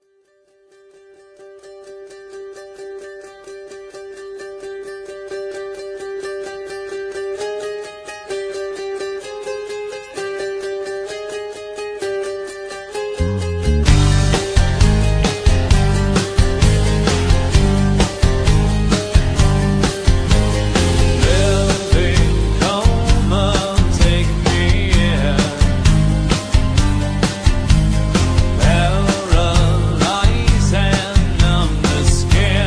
acoustic

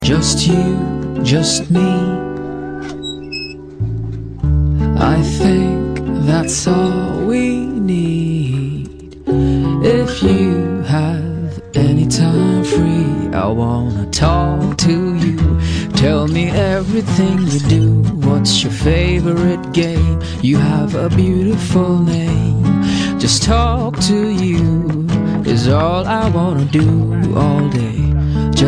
vodafone sms; ringtones

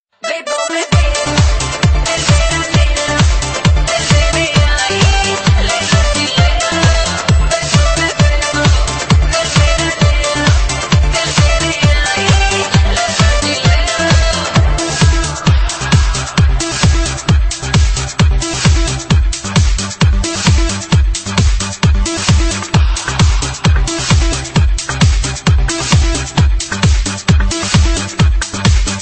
Clubmix

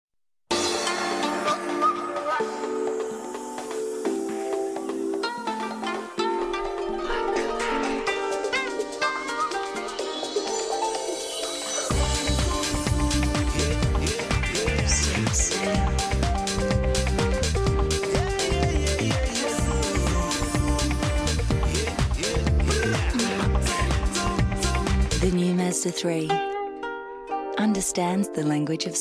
Mazda Ringtone

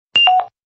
two beep; classical

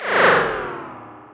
New Sound Sms

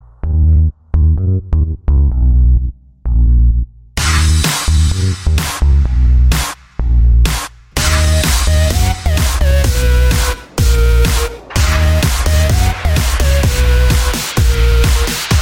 Melody Mix

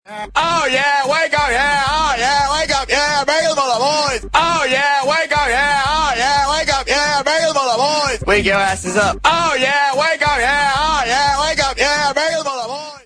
alarm clock; jersey shore; pauly d

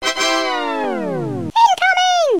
worms funny sms

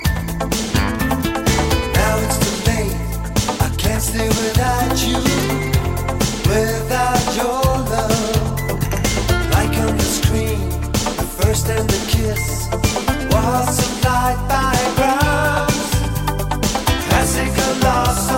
love classical

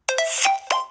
Happy Hubble Bubble

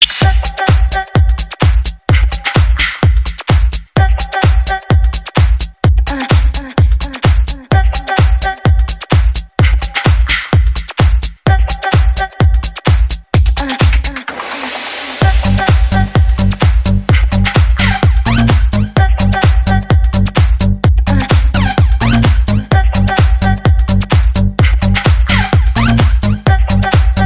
Rhythmic guitar

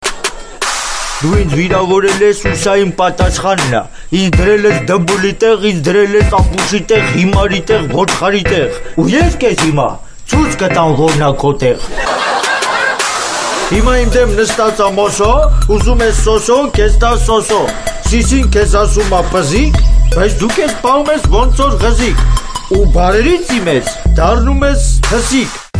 Rap

